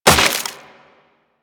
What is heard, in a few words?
Melee Impact Wood; Warframe; Weapons